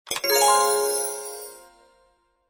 shop buy

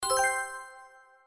start button

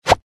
close

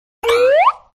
piggy move

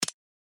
mouse over

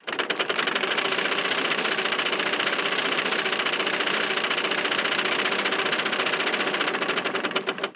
wheel spin

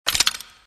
lock

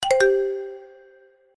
layer close

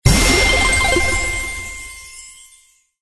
celebrate

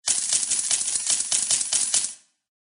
coins; collect

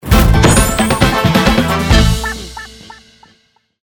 normal reward; lucky charm

